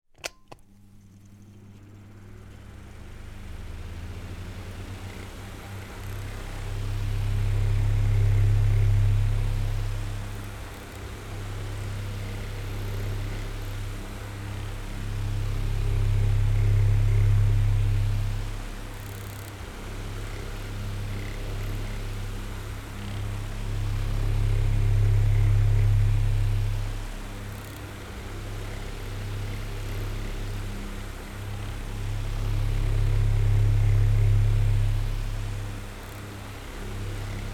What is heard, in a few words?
household fan desktop rotating left right recorded rear